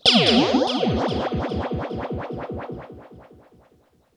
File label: RIPPLES L, Funk Guitar; 90 s Sample CDs; Zero G